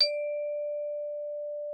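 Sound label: Xylophone D4; Xsample VOL-4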